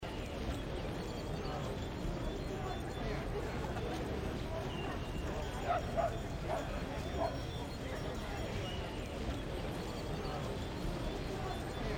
ambient sound city day; baldursgate

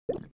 tears fire

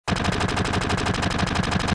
gunfire; final fantasy vii

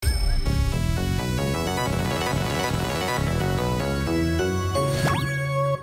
celeste sound challenge completion

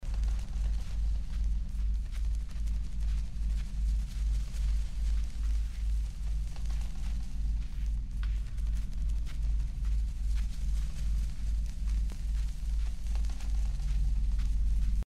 soundscape abyss; gris

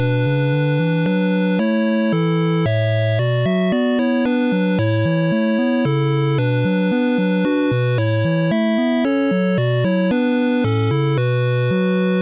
italy music; 8 eyes

